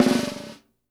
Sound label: BUZZ, SNARES; Sampleheads; New York City Drumworks VOL-1